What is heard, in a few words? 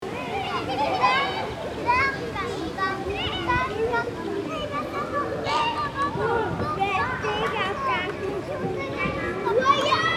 Soft play indoor kids rubber mat squeaks loop; playground